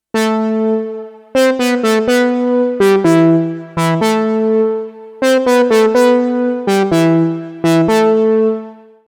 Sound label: UNO Synth Pro X Presets